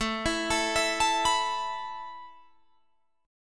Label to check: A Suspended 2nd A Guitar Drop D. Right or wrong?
Right